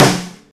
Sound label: Perfect Snare One Shot F# Key, acoustic snare sample tuned to the F# note